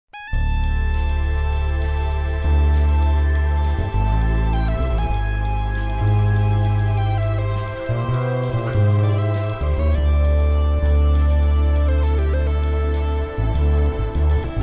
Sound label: jazzy fusion; jammy experimental um jazz